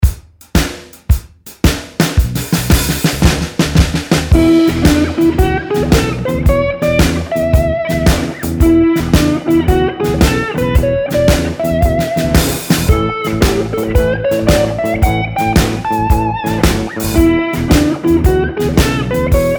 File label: slide